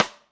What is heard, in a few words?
Side WH, EMU Orbit-3 drum machine; 300 Drum Machines